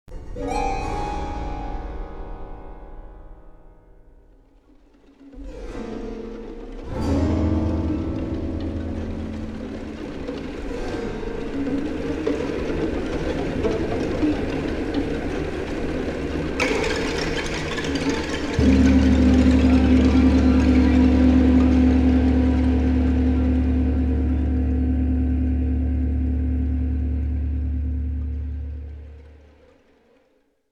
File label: music sewer ambient threat